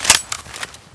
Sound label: ammo pickup